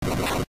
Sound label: big punch; Super Smash Land